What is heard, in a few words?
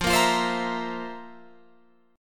F Major 7th Suspended 4th, Guitar, Open F